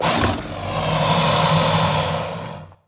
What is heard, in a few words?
salamander idle; DAoC